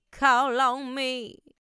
free vocal samples

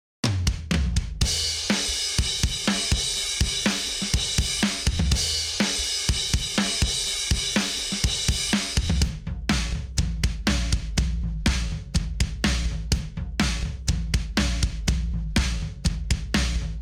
drums distorted; reason essentials

